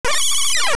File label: Big Octo; Big Octo screaming